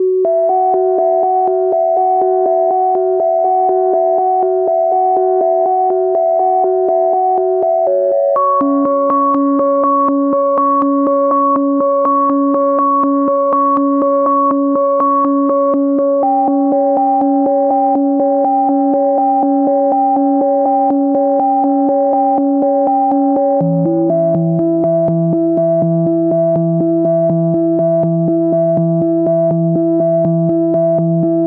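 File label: 122 BPM Melodic Arps C major A minor Tonic B; MMB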